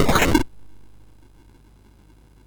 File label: sid MOS6581R4; Movie Monster Game